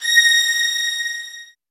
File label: A, soft violins